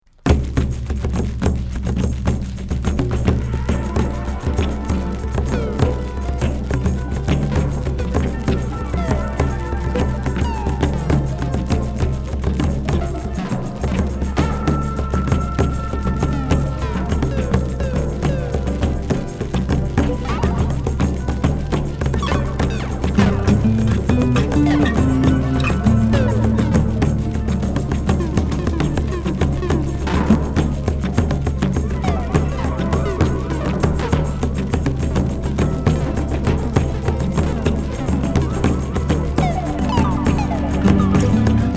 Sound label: Brazilian Psych Jam; Drum Circle, Trippy